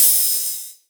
Ride, Cymbals; Vengeance Samples

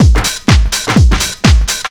drum loop 125, vocal house; PS-28 Vocal House Samples; drumloops; mixer

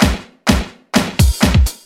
Housebeats, 128BPM; Vengeance Samples; VEH2 Loops

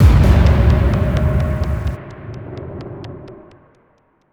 FX Xplosion, 128 BPM; Vengeance Samples; VTDS2 Song Kits; Vocoder Mother Earth

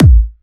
Kicks; Vengeance Samples

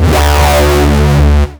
Synths D; vengeance essential club sounds-3

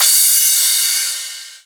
Crash, Cymbals; Vengeance Samples

